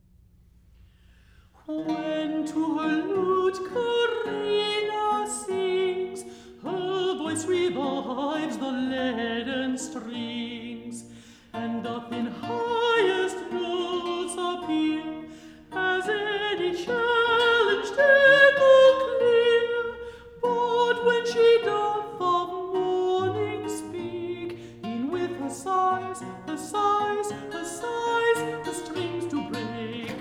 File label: Lute Songs; When to her lute Corrina sings; Campion